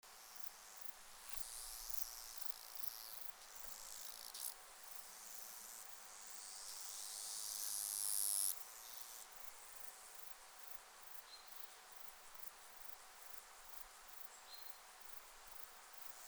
Stenobothrus nigromaculatus Balz Platycleis; Thermenlinie

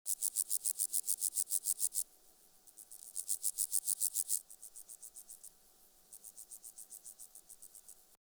Chorthippus vagans mehrere M, Thermenlinie

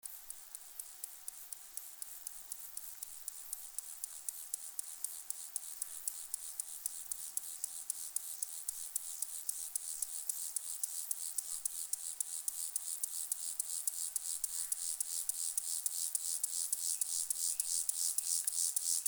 Chorthippus mollis Balz; Thermenlinie

